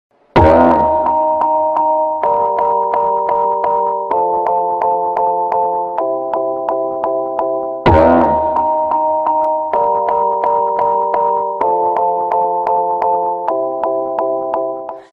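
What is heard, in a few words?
standard SMS message on Samsung Galaxy S5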